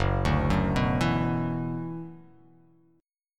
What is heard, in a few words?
Gb 7th Suspended 4th Sharp 5th Gb; arpeggiated; JGuitar